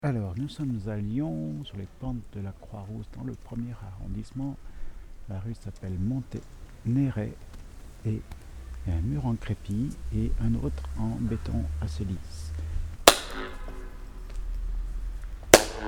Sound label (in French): echo flottant, Lyon